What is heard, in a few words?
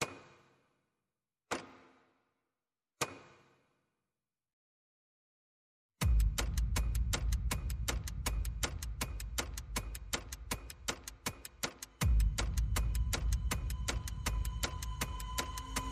Percussion and Effects Only